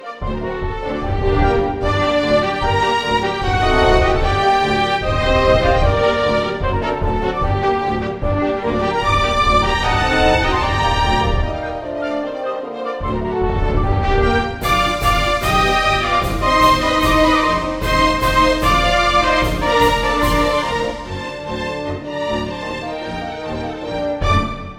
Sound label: hungarian dance no5